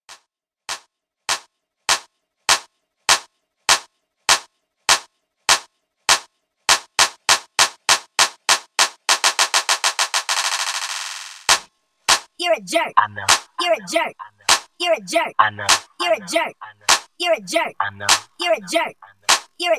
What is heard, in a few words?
Clap Intro